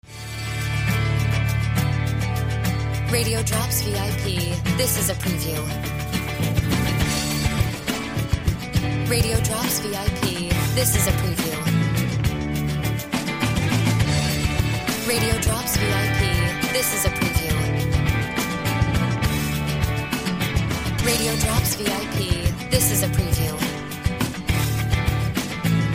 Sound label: Music Bed